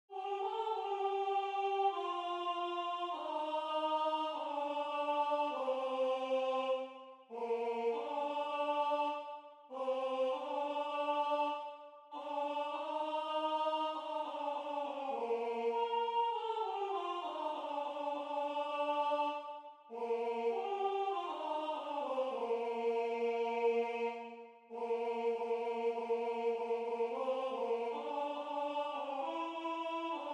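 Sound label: Mixed Barbershop Alto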